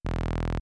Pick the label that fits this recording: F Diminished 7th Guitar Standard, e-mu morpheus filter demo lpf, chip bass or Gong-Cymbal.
chip bass